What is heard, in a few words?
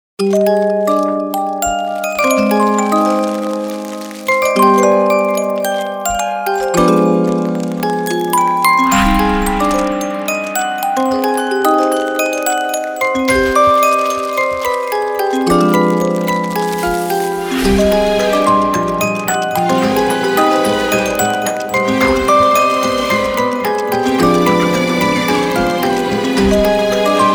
music box winding noise up